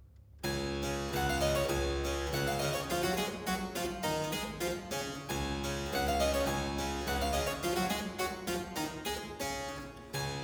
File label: Musette; J.S. Bach; BWV Anh. 126; Notenbüchlein für Anna Magdalena Bach